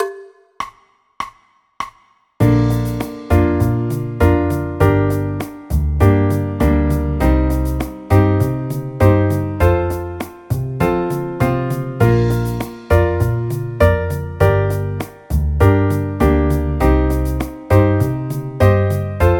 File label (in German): bb dur akkordverbindungen